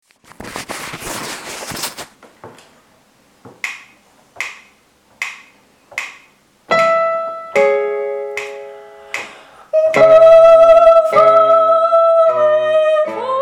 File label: sopran